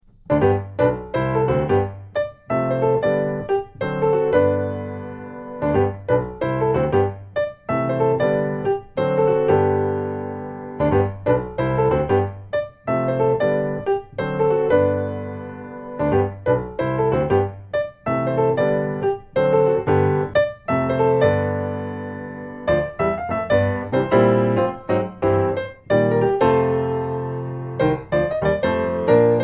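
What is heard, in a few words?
piano solo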